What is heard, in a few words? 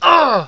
"Ugh"; Riviera; Ein